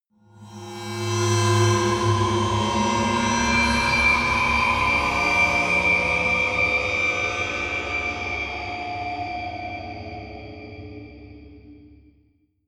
creepy cymbal stinger

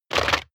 crab movement cracking short

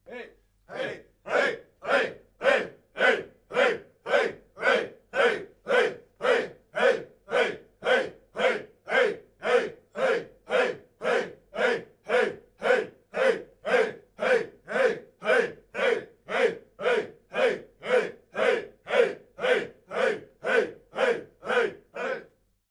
chants simple

